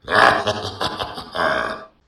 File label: evil laughter